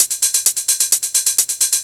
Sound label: hihat 2, 130, drum loops; Ueberschall; Techno Trance Essentials